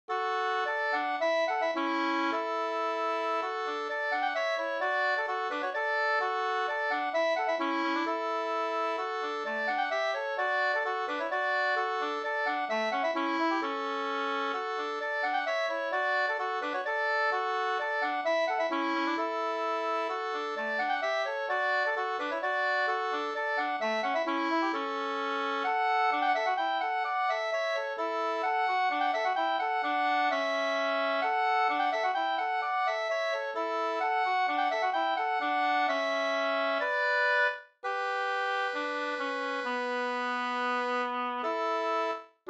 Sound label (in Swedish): folkdans; Snurrebock fr Mp 2st